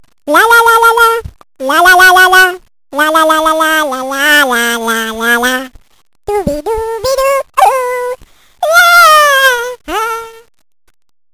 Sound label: Lalalala